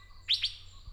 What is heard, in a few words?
fairy bluebird mimicry, racket-tailed drongo